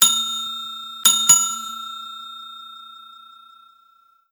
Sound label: front desk bells; daniel simon